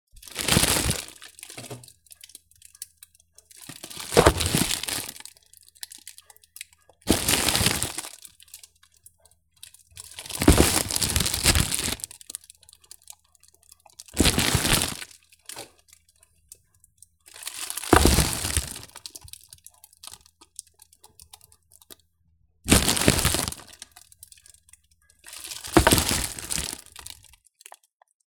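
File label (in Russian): месить и тянуть звуковой эффект разрыва пластикового пакета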